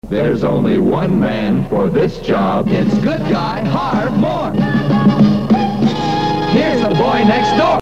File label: jock jingles; 1966; spot productions